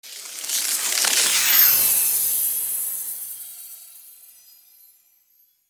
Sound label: Fairy wings fluttering